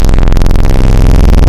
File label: Dirty PWM, wavetables, Analog; Massive 3-Analog-Electric